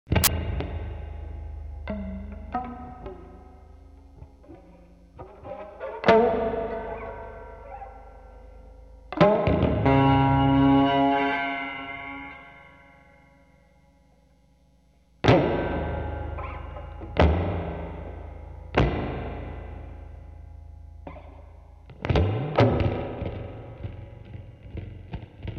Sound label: electric guitar